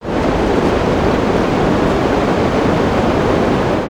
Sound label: falling ego